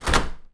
household door handle lift deadlock pvc conservatory 3 feet away